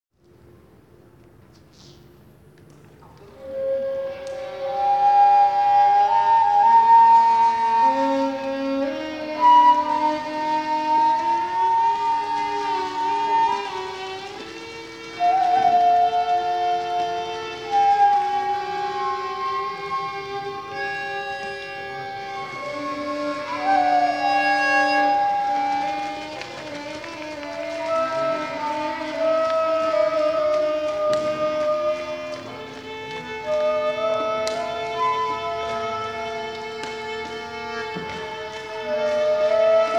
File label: improvisation